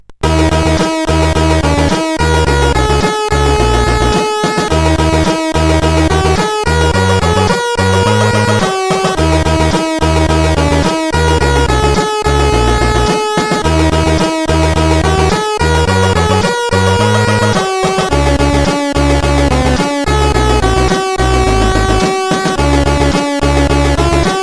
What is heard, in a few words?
music, sid CSG8580R5